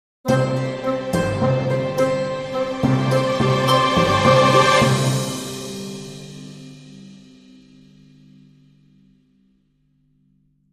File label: Dramedy Sting, Short